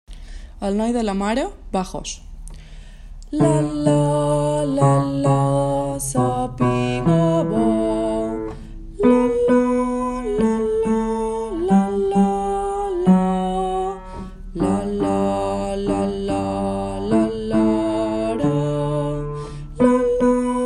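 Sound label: bass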